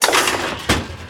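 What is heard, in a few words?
weapon reload; redglare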